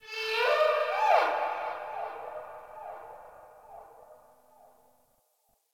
Distant Creature; Ambience; Warframe; Eidolon Plains

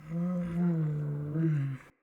Wolf Idle Friendly Moan; Animals; Vocals

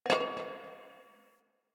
Garbage Metal Can

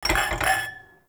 Glasses Clink; Ambience; Warframe; TheNewWar; Zariman

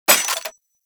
Burst Rifle Mech Secondary; Grineer; Weapons

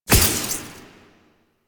Corruption Pickup Small; Warframe; Missions; Zariman

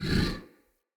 Corrupted Sproutfolk Jump Short, Vocals; Enemy; Characters

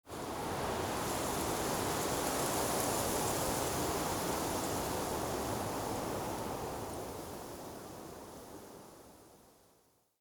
Wetland Forest Day Wind Gust Relaxed; Midrath Island; Ambience

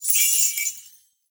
Naginata Whoosh Tonal, Weapons Melee

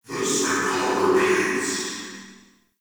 Ghost Soldier Vocal Idle; Enemy; Wandering Ghost Soldier

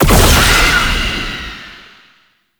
Unhallowed Curse Hit; Warframe; Dagath

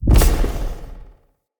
Staff Caster Cast Long Shot, Weapons; Player; Staves; Galene

